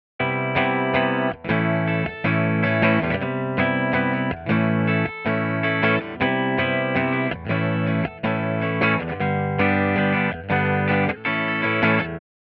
Chords Dirty Before

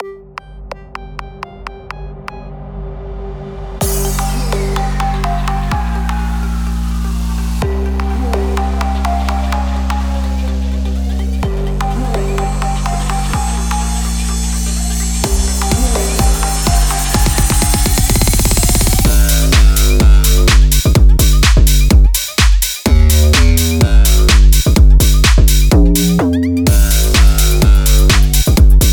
Bass; Unison

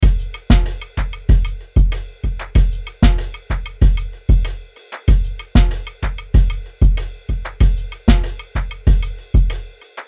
Latin 95BPM

